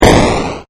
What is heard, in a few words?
damage; Wreck-It Ralph